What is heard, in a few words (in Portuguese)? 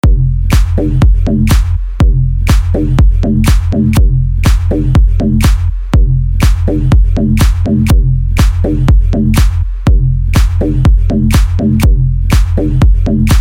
base mega funk tum dum dum deep house; pack bases mega funk 2020